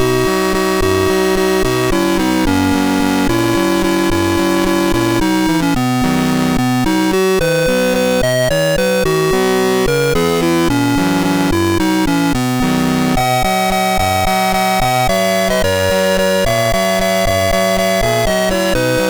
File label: Tum Balalaika, MOS6581R2